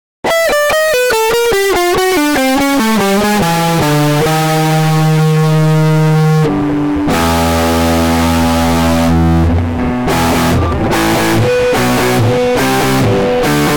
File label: distort, quadrafuzz